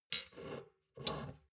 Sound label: shot glasses pull out slide soft; berufe; soundpool